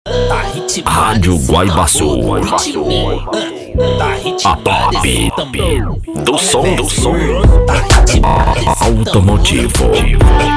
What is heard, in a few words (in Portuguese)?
mega funk; RADIO GUAIBASUL